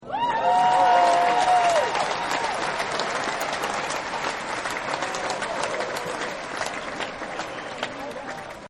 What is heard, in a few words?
Applause Woo-Hoo; Applause Woah; Sound Effects:Human